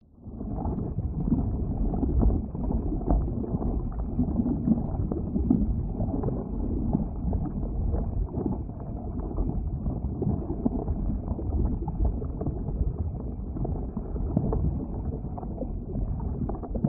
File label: nature lava flow; zapsplat